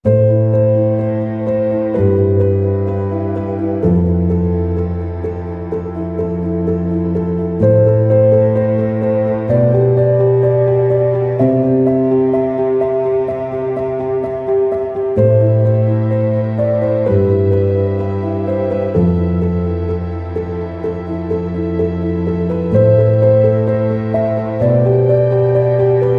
No Perc

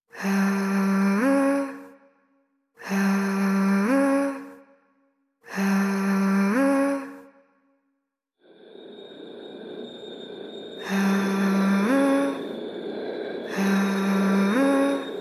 No Percussion

